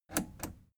plastic button scratch click enable slow

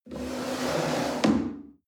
chair wood slide on floor medium bump

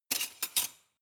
cutlery large drawer grab hard

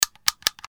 switch

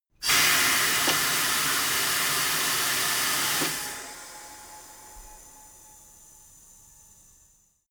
tire changing machine hydraulic out

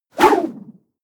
swoosh

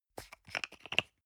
toothpaste screw cup open medium

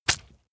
leather impact mud soft squelch

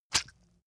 leather impact mud medium squelch

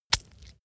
leather impact mud medium

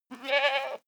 goat baa shy male

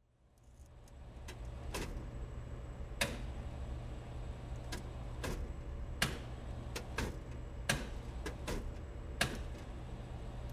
food vending machine turn

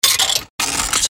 insert coin

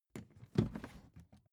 suitcase open case quick

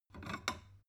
stone bowl shelve light

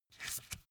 magazine flip back soft

